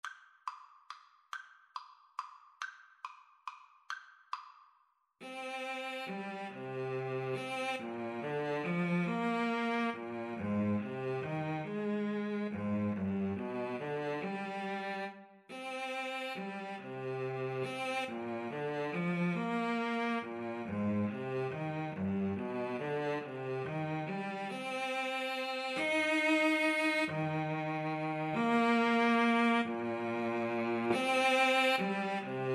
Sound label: trumpet cello, Trumpet-Cello Duet; greensleeves